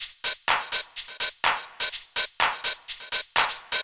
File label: DEEPHOUSE BEATS 125